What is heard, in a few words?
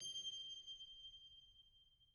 reel bounce; netent